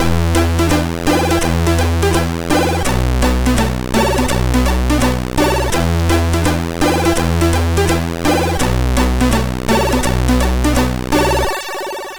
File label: Spinnin Violin, MOS6581R3